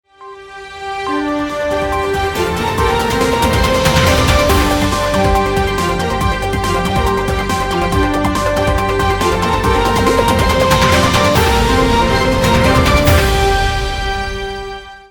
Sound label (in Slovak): Zvučka večerných správ